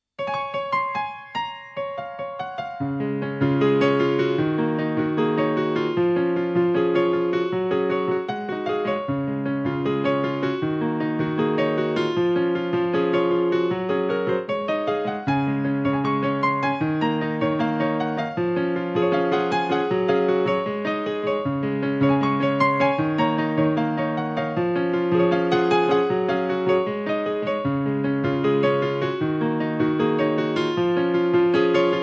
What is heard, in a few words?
Deep Blue Upbeat Happy Instrumental Piano; CrusaderBeach